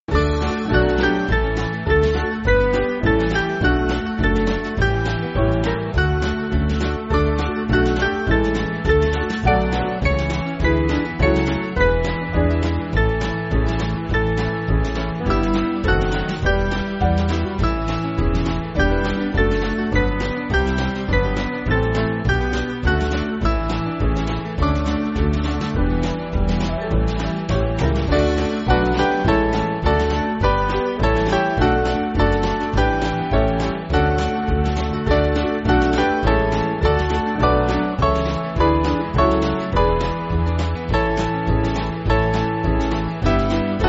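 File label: Band, Free Music Backing Files for Churches; O How Blest; O Wie Selig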